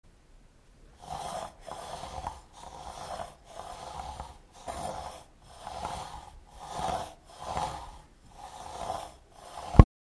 kinder nudelholz

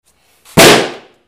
platzender luftballon

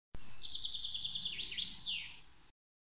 Vogelgezwitscher Frühling

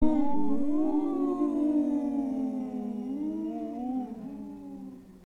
gespenst

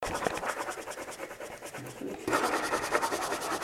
Malen mit Buntstiften

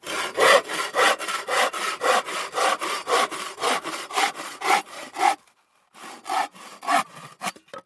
handsaege

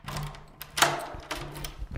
Tür aufsperren

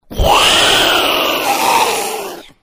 fauchen

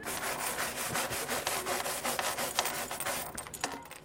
buersten auf metall

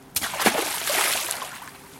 wasser platschen

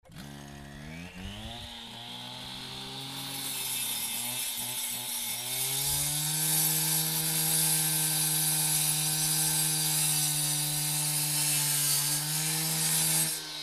Trennschleifer beim Schneiden

